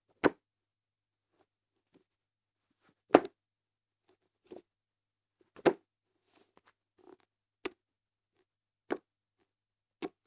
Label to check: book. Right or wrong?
Right